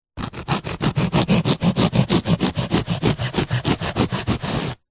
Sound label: foam block dense cut slice saw fast serrated knife